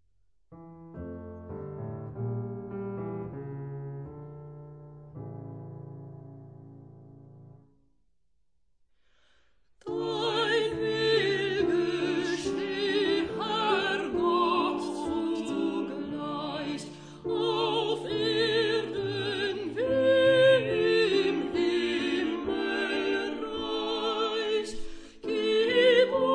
Dein Will gescheh sopr zang

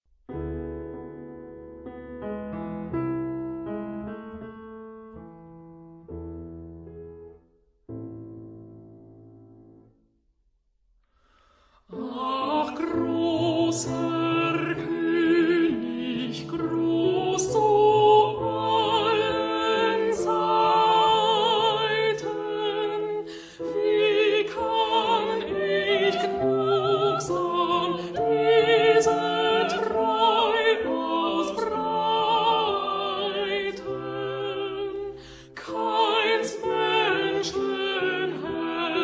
Ach grosser Konig zang alt

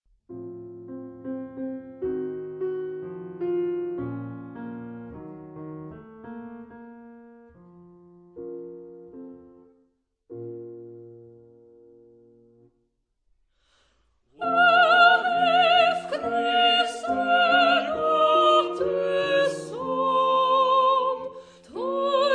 O hilf Christe Gottes Sohn sopr zang

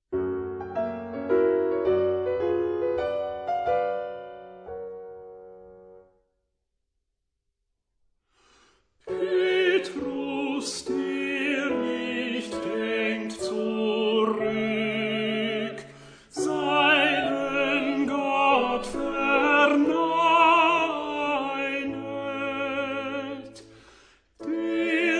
Petrus der nicht denkt zuruck tenor zang

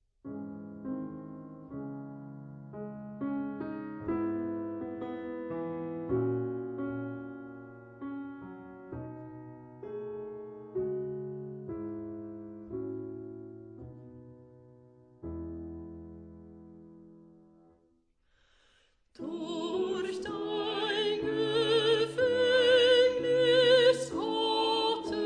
Durch dein Gefangnis sopr zang

